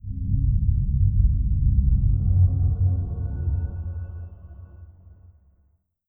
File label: Samsung Galaxy S90 Startup